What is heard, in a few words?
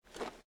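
footstep armor; Smite; god Kuzenbo v2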